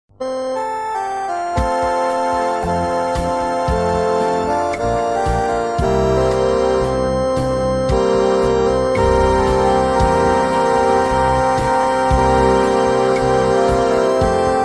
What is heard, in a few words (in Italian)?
solo musica